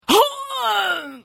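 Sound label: Hit, Khajiit female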